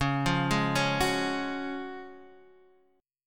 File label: F sharp Major 7th Suspended 2nd, C sharp